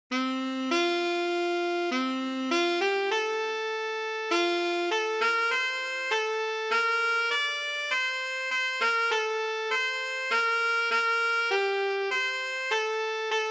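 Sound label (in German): Sankt Martin, Alto Saxophone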